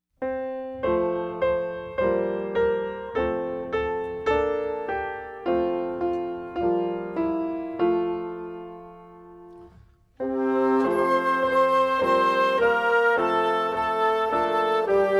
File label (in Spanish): musica solo